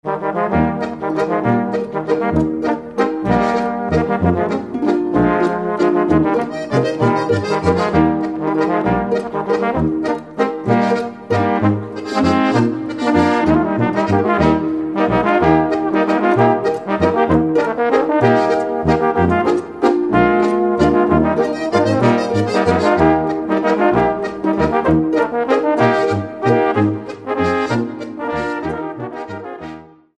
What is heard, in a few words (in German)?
walzer; hoheitswalzer